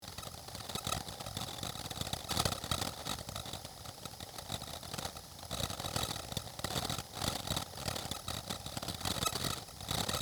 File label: rime accumulation